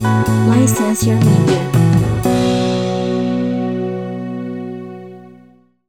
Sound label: Bossa